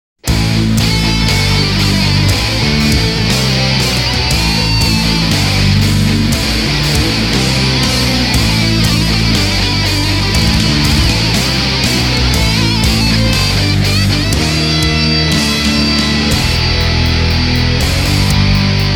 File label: Wrestling Rock with Lead; Dragon Ball Z Best of - Volume 3